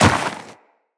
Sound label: Walk Snow, Footsteps; Tenno